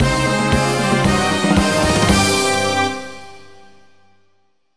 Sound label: intro music; skater